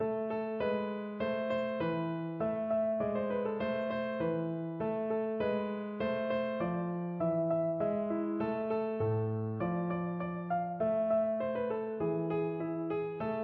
Sivivon, piano

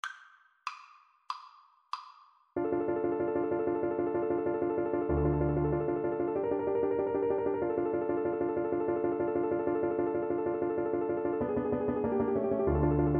scene finale, tuba

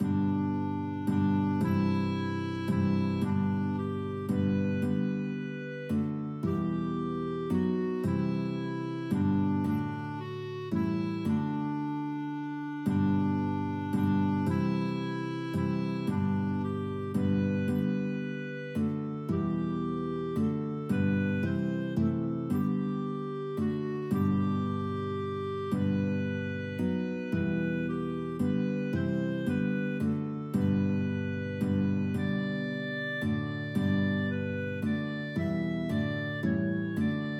guitar clarinet duet

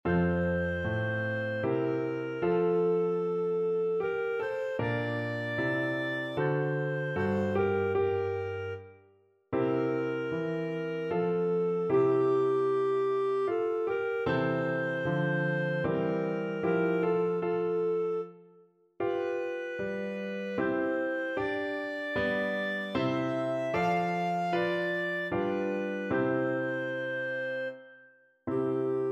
land der berge austria, clarinet

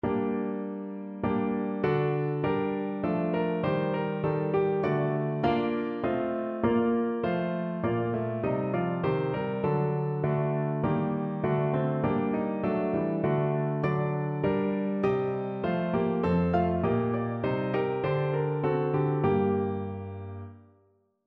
what star, piano

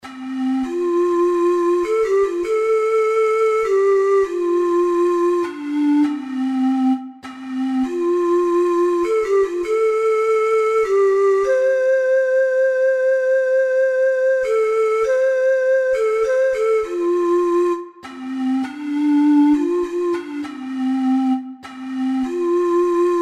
amazing grace, ocarina four hole

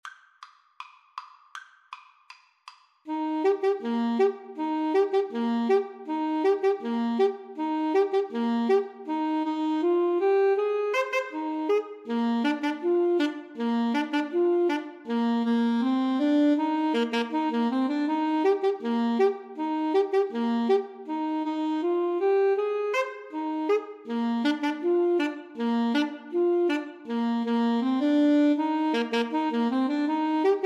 wabash, Clarinet-Saxophone Duet

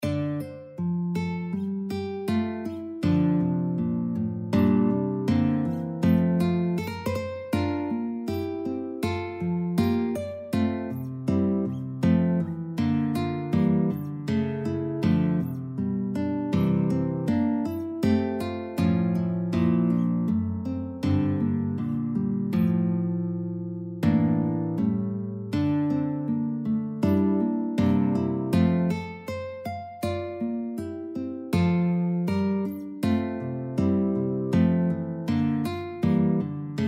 prelude, guitar

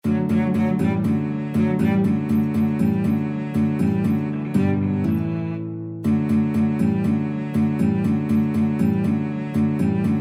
cello guitar, guitar-cello duet; vivaldi autumn

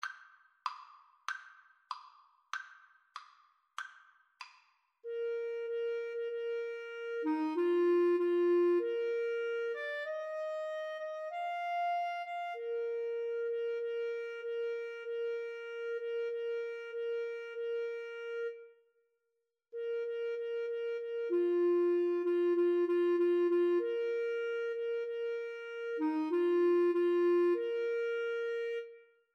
Flute Clarinet Duet

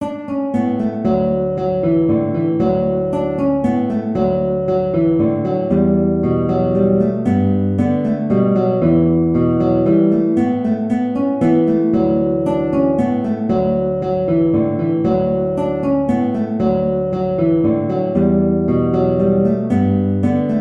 valse, guitar; la Valse des Jeunes Filles

